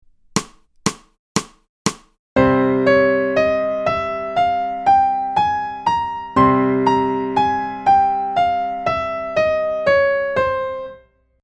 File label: scale